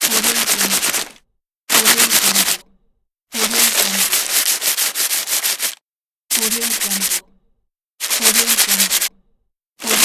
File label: friction paper